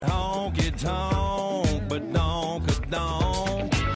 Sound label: honkeytonk